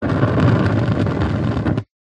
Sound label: nature fire flames small burn wind blown then out short